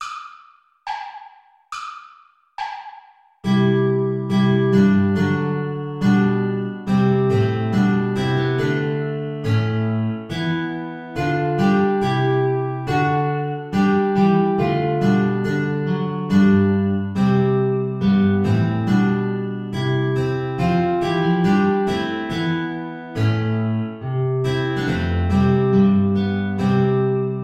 lobet den herren alle die ihn ehren play along without clarinet